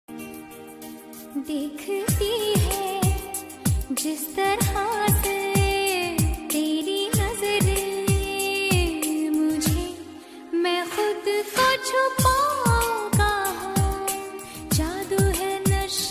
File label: Romantic Female Ringtone; ringtones